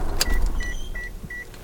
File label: water pump; car